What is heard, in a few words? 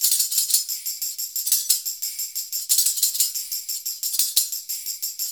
90 MIX, Percussion Loops